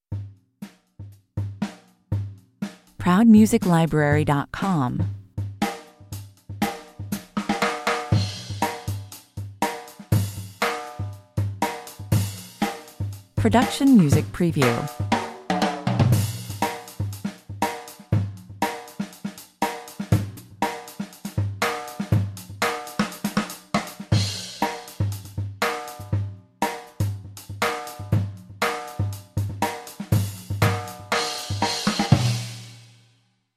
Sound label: drum solo